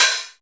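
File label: HHOP 909, TR-909 open hi-hat; 90 s Sample CDs; Sound & Vision - Gigapack I CD 1 (Roland); TR-808 & 909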